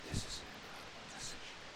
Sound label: one whisper